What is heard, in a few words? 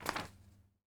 Page Turn